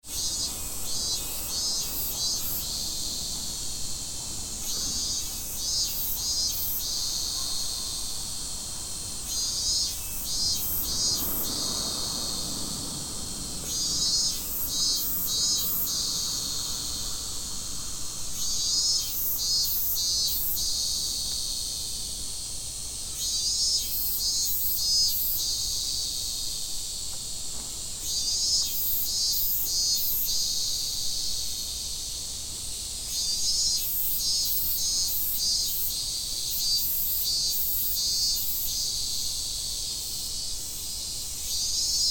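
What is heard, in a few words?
cicada; fukudai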